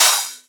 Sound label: Cymbals HH Open; Vengeance Samples